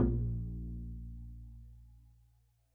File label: pizz bb1, double bass